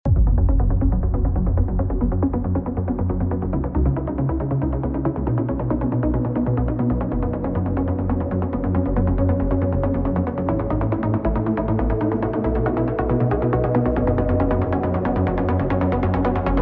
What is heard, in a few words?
Noise Trance